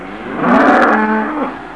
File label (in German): muh